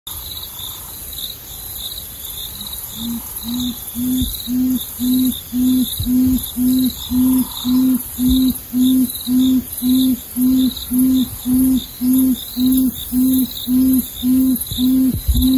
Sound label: Papuan frogmouth